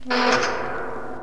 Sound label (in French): porte grosse; Feed The Beast